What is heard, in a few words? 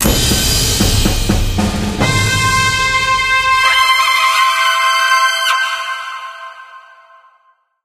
rank four achieved